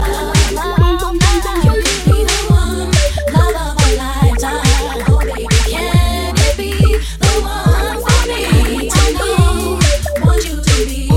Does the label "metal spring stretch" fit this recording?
No